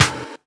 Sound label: Claps; Vengeance Samples